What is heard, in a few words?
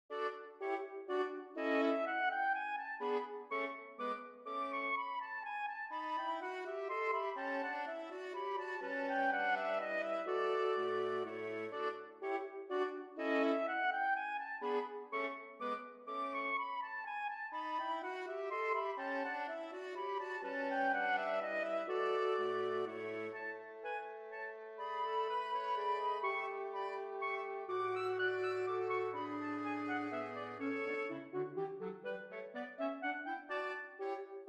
String Quartet in D minor; String Quartet 35; II. Menuetto, allegretto